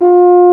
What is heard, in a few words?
BRS BARI F3, FRENCH HORNS; Roland - LA Composer vol. 1